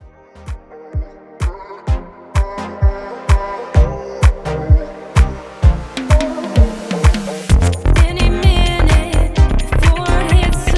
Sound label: Electro Pop; Le Corbeau d'Argent Production